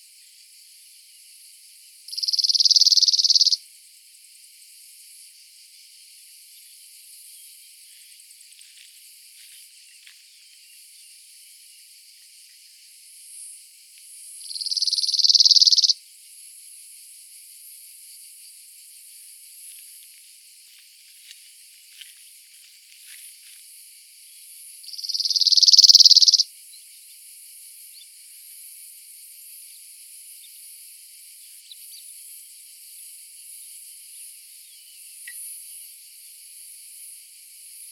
call, cirl bunting, Emberiza cirlus, full song, adult male, A few song phrases with fast rhythm. Background: cicadas